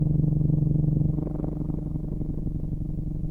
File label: Power Fluctuation